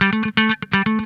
FULL PICK, Funk Guitar; 90 s Sample CDs; Zero G

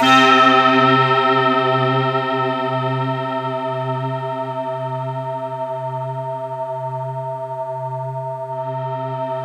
C4, SynthLine; 90 s Sample CDs; NiceBeats; SynthLine VOL-22

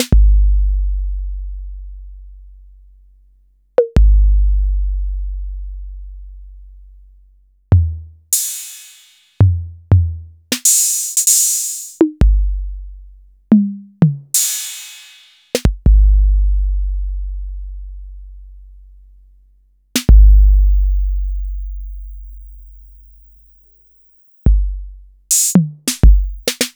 TR-808 Morph

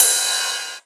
Rides, Cymbals

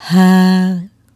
Hah, Vocal Lix, Swingin' Single Notes

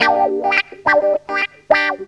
GTR, Funky Elements GTR RIFFS; Zero-G

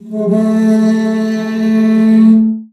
CELLO FINGER; 90 s Sample CDs; Xsample VOL-5

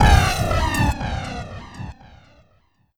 Metal; Sonic Foundry (Sony Creative Software); Esoterik Beatz; Mecan FX

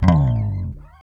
REAL BASS, BASSLICKS; Ueberschall; Jam Box

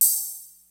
JD-800 KIT

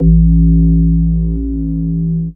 Helter Bass; Big Fish Audio; Old Skool House; OSH Bass

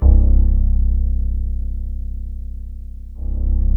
C1, SynthLine

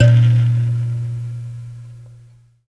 BUZZ, Buzz Kalimba; Roland LCDP11 Africa VOL-1

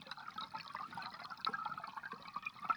Waterway Dark, Water; outdoor

